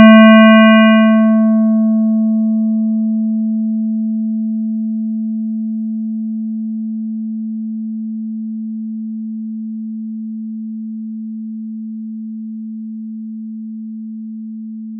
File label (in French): signal carre 220hz